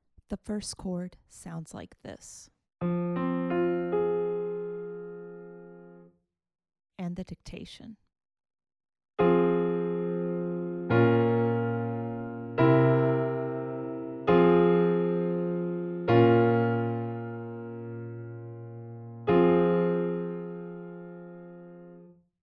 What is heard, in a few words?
harmonic dictation